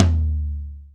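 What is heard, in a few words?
ALESIS HR16, drum; Best Service ProSamples vol.55 - Retro Sampler; AKAI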